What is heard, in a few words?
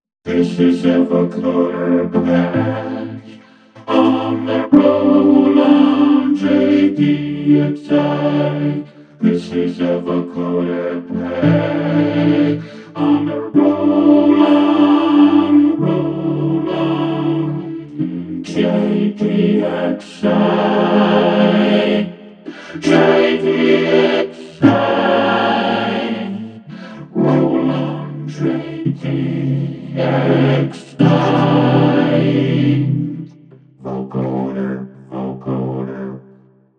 Vocoder Ensemble